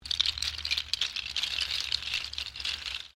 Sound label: RATTLE SEED CAPSULES, Musical rattle made from seedpods